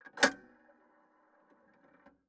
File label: PayPhone